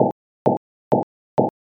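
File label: footsteps soil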